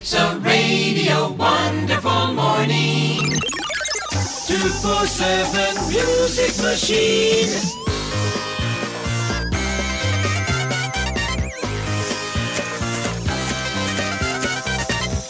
National Radio Music Machine, Radio style ads intros sweeps & jingles